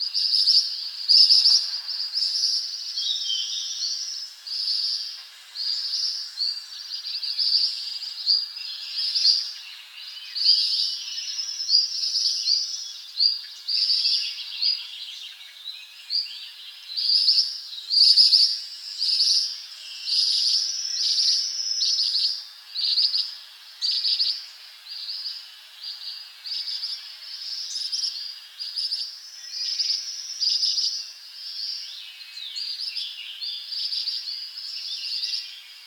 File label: Pericrocotus divaricatus